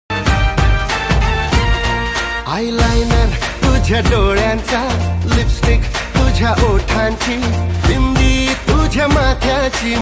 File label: small cute girl marathi song